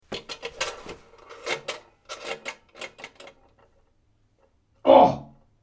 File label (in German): bad waage